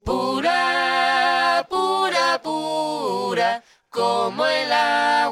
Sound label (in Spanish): COROS; multitracks y midi